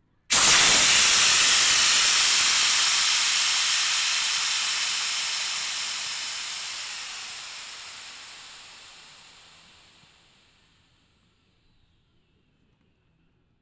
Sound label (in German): Pfeifen